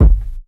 steel kick drum sound F key; drum single hits one shots; kick drum samples

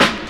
hip hop snare drum sample c key

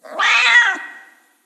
cat meowing aggresively; sound effects

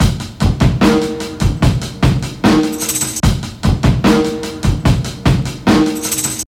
74 bpm drum groove f key

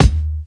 00s hip hop kickdrum d sharp key; kick drum samples

